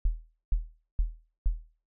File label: kick